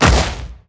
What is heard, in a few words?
suplex grab